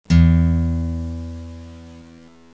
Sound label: Note E6, Tuner